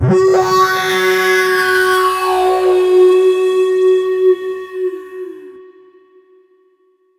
Tonal FX F#

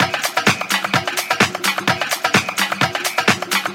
Percussive Loops 128BPM; Vengeance Samples

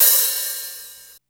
Hat Open

